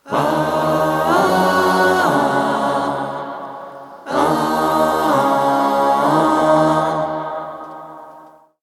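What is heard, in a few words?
Monastery Phrase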